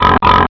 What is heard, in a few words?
pig